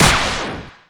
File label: Enemy Delete; Disney Star Smash; Android, iOS, Mobile